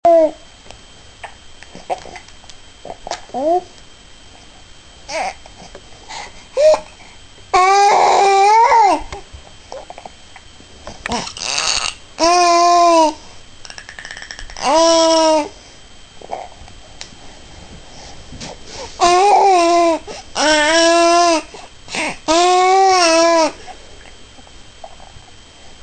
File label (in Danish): morgenlyd